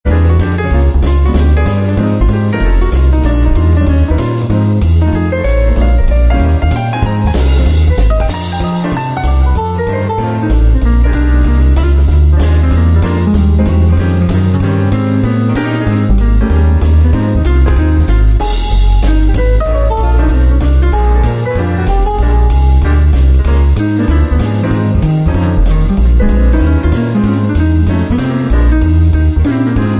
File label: Piano Sway